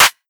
Clap; pitchglitch samples; DrumDigger

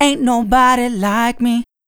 Vocal; pitchglitch samples

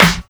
Snare Smash Hip Hop

